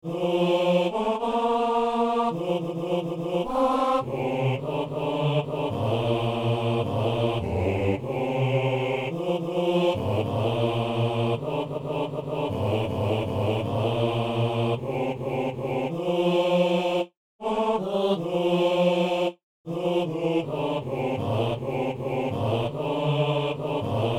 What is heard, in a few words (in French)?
basse